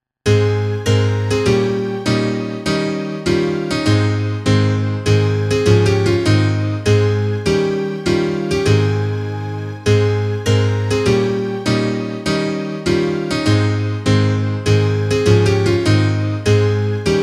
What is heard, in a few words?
instrumental